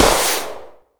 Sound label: flaregun fire; tools